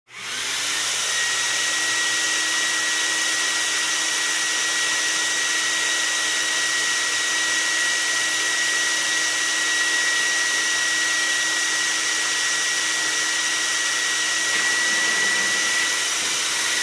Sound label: shop vacuum